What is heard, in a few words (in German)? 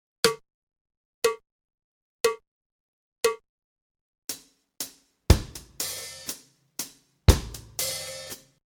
Uebung, schlagzeug